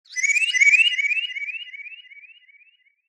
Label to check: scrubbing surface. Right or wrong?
Wrong